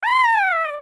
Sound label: Buzz Death